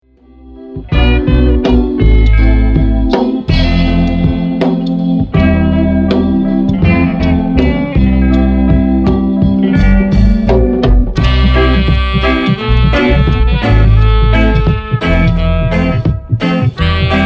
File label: Wailing Dub